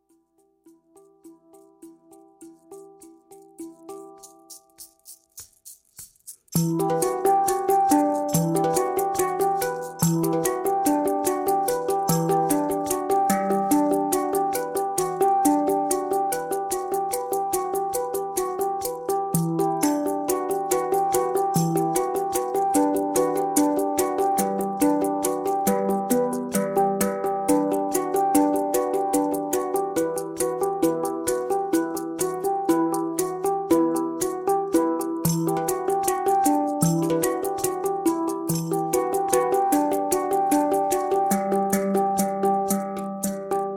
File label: Hang Drum Musics, Darkness